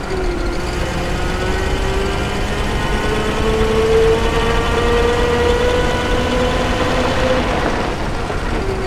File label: Truck Run; 1944 Battle Of The Bulge